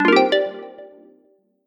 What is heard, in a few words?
complete or success sound; audioblocks